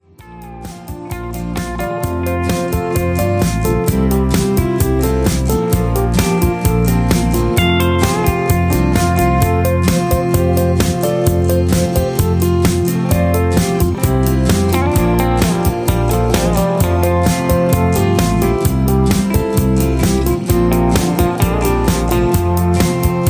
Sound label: Instrumental with BGVs